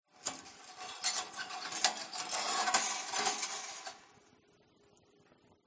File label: Fireplace Curtain